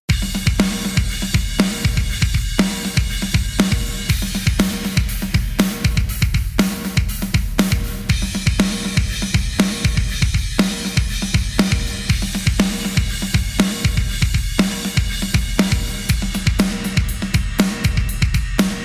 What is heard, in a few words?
drums; strike sound artistry kit